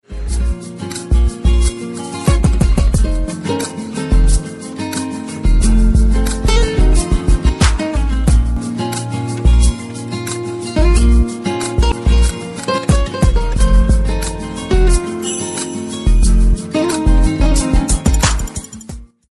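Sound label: spanish guitar ringtone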